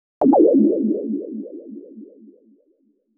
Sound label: anime style; fanservice